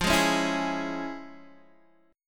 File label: F Minor Major 7th Sharp 5th, F, Guitar, Open D; x,8,6,7,7,6